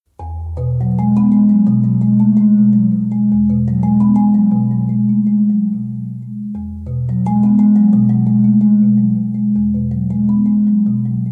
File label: Marimba